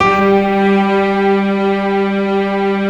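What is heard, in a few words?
G 3, PIANO STRINGS; Best Service; Advanced Media Trax Modern Composer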